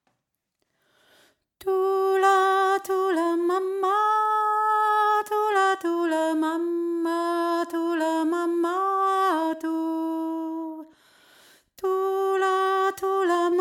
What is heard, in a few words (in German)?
thula mama hohe stimme